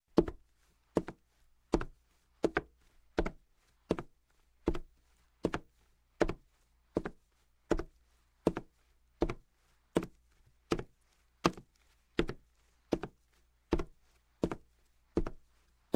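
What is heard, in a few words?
Footsteps, Hardwood - Boots; Sound Ideas 2000 Series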